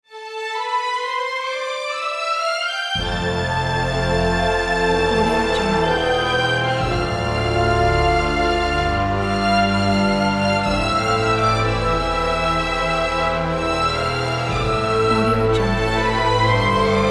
heroic struggle movie theme; sound-ideas

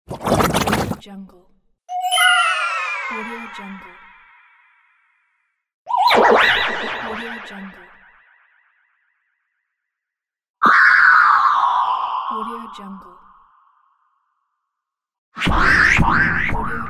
comic sfx

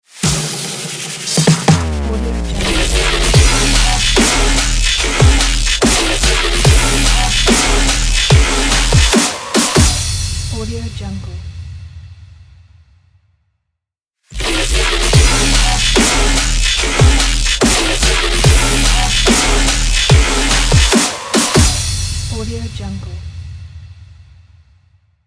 riddim dubstep logo

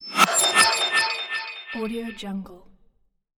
glitch logo

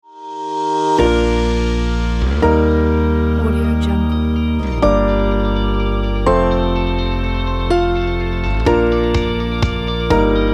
uplifting emotional inspiring corporate; inspiring-sounds

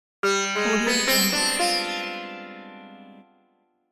mysterious sitar reveal; monochromeeffect

